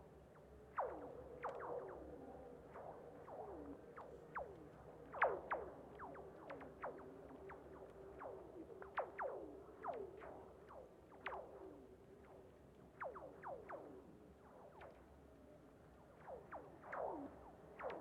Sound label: lake; soundscape; marc Namblard